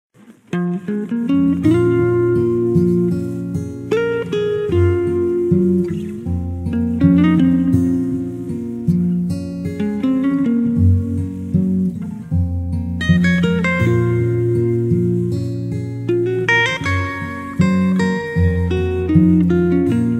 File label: Guitar Instramentals